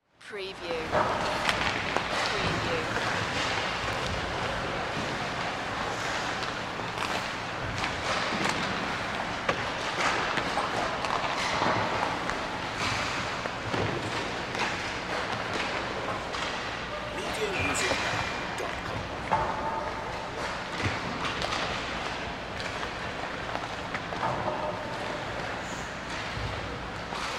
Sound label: Hockey Practice; sound-effects-bible